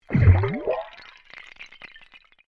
Underwater Bubbles Burst, Air released from compressed air cylinder; Nature